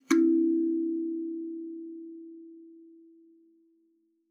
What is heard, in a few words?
KALIMBA E4; Xsample VOL-7